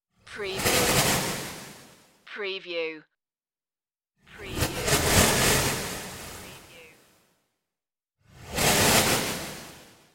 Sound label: time flutter whooshes